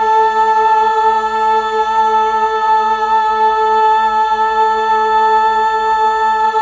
Fem A2, Mellotron Female Choir

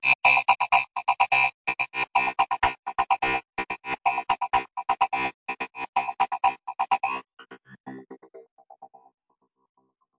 126 space banjo; SYNTH AND ELECTRONIC LOOPS

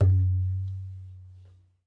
Ghe Open, Tabla; ETHNIC and WORLD PERCUSSION

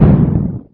explode, video games; sound effects and noises

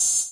Syn Dr, Overloaded Synthetic Drums; style kits

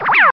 Laser, Cheesy Lo-Fi Sound Effects

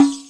arabic perc, Arabic Percussion Kit

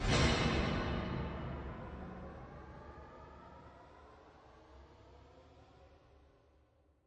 far off string clunk, Guitars

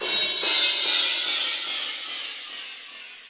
descending crashes; DRUMS (SINGLE HITS)

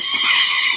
subway sound; Subway Sound Construction Kit

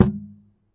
chassis hit, typewriter; UNUSUAL KITS and FX; Bauhinian Vibes Typewriter Kit

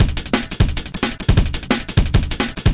straight shooter, drum loops and breaks, 175 bpm; 161 to 180 bpm

